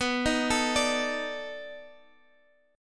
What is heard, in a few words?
B 7th Sharp 9th, down, Guitar, Half Step Down